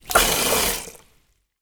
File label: household water spray into plastic short burst